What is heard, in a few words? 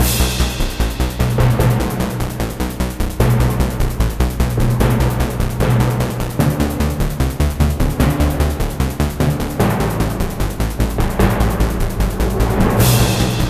Battle Sequence